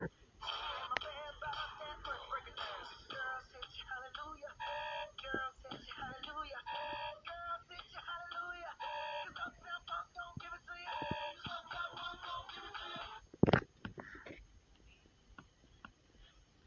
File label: scream remix